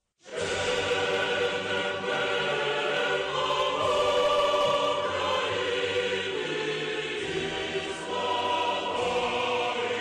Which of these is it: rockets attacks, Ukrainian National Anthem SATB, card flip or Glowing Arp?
Ukrainian National Anthem SATB